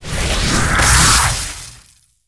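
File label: Mech Scorpion attack; monster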